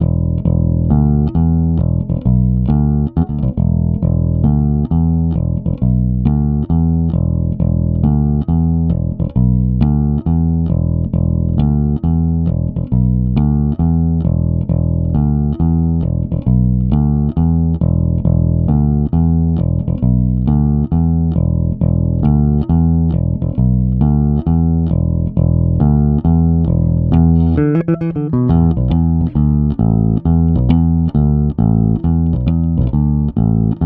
EQ Bass

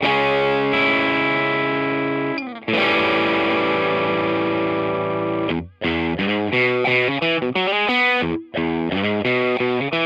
Electric Chorus

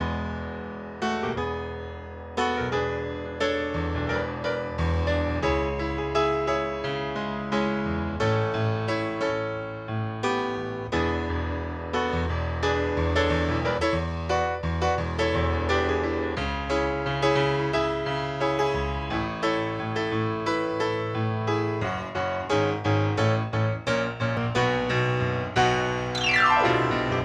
EQ Piano

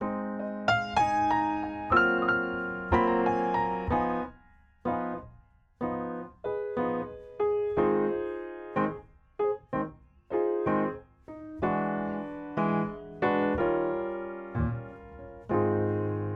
Piano; TubeTech; CL-1B

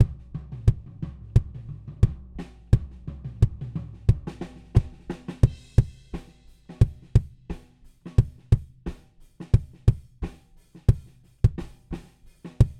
Kick

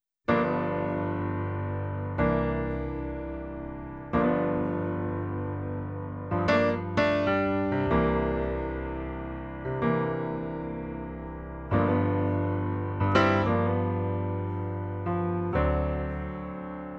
Piano Fet II Fairchild; Daking

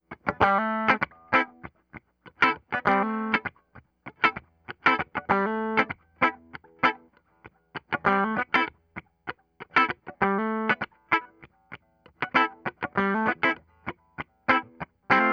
Electric Guitar Fet II Fairchild; Daking

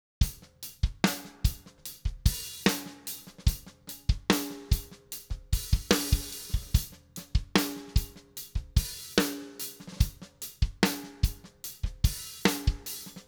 Drums Flat; Daking; Fet III

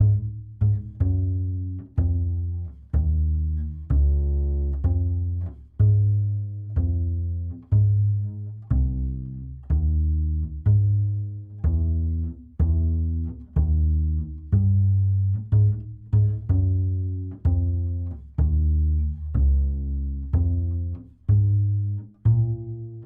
TubeTech CL-1B Bass

